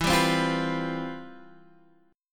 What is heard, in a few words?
C Major 11th E